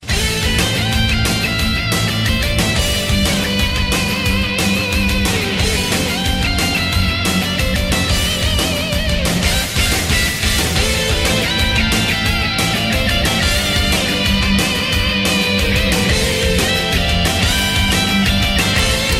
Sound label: Soundtrack; Shippuden